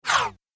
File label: Phat Whoosh; Phat Whoosh Echoes; Whoosh Sound Effects; Andrew Fleming